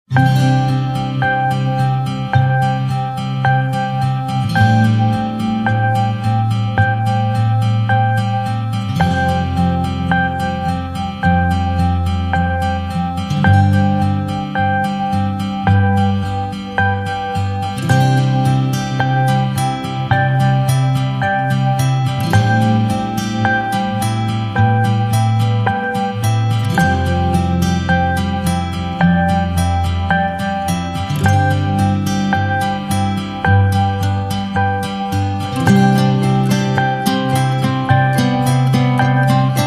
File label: a small miracle